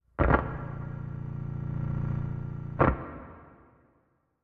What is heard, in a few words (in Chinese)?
诡异森林氛围声; ambient